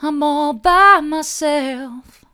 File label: soft, vocal